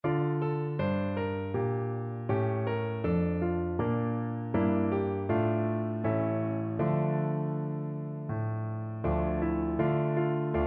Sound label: piano